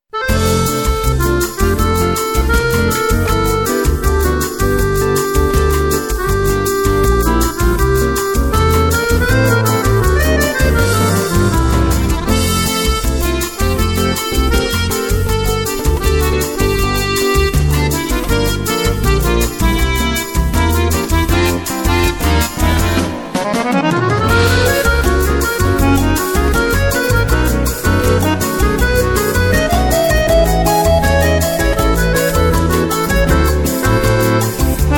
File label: bossa